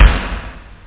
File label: kick; OctaMED SoundStudio V1; jazzies samples; perc; kicks